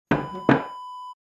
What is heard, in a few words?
wooden chair set down